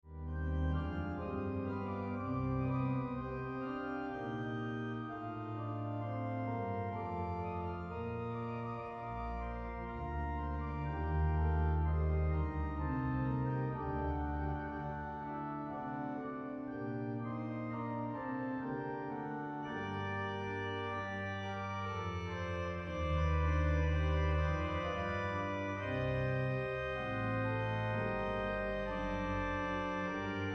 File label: organ, passiontide